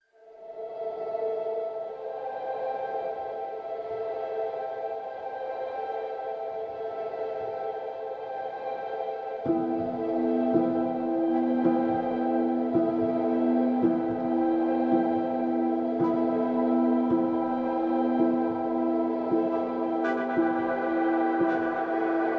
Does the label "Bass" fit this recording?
No